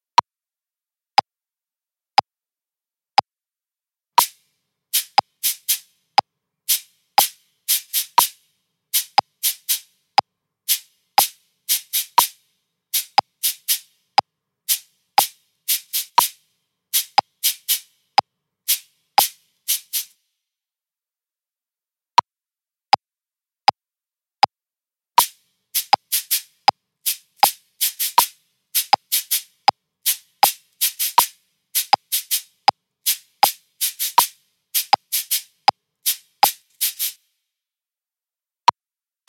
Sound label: Cabasa; shakers